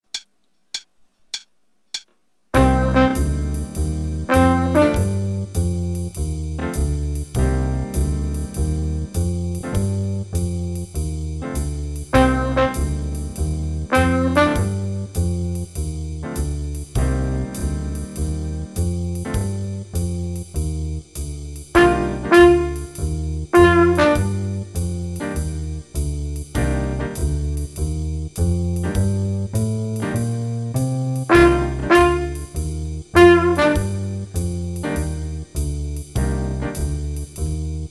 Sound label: Improv Cmin with Jazz Rhythms (with trumpet, qn100)